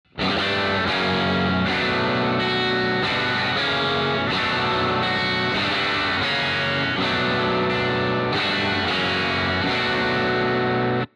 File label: Crunch Guitar